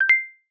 Connected, AirPods Sounds

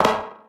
pipe land; Golden Crayon Sounds

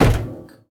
metal step; Golden Crayon Sounds

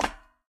ladder land; Golden Crayon Sounds

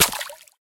puddle step; Golden Crayon Sounds

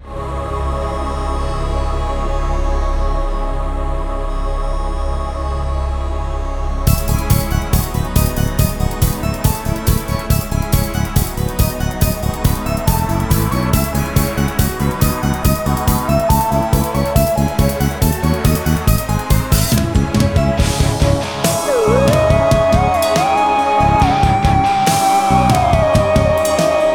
big battle; oghma; Manamon Music; Manamon 2

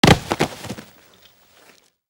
dirt fall; Golden Crayon Sounds

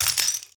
broken glass step; Golden Crayon Sounds

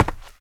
wood step; Golden Crayon Sounds

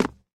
concrete land; Original TK Sounds

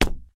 tile land; Golden Crayon Sounds

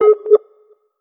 Call Connecting Loud; Skype Sounds

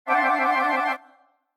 Bloom, iOS Sounds

